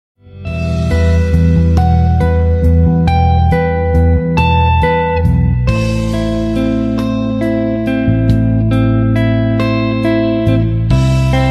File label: Guitar; english instrumental ringtone